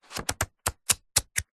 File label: household book artists sketch pad pages flick